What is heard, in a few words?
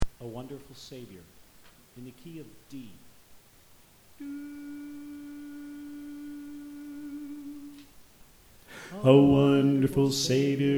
Bass; A Wonderful Savior